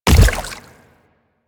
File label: Splitter Step Water, Footsteps; Warframe; Infested; Grey Strain